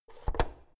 oven knob press out hard

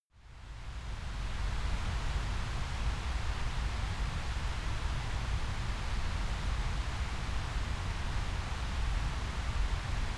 stream distant

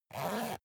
zipper use short

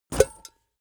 leather metal bodydrop

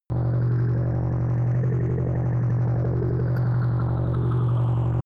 low engine rumble

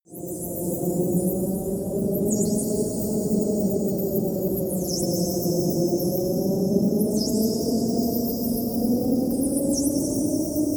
space shuttle blowing wind

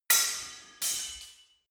sword hit sword single

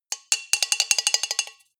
bowl hits rattle fast damped

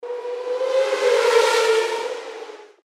f1 pass by

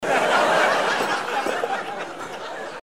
laugh table

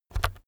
keyboard external key press very fast soft

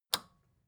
record player power release off

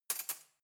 cutlery knife drawer place

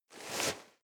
nylon leather soft short

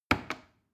plastic button small click heavy slow

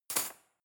cutlery cake fork drawer place hard

